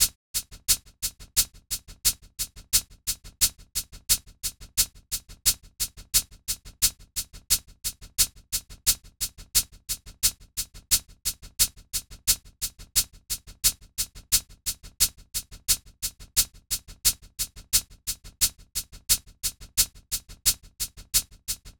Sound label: Hihat Loop - 88 BPM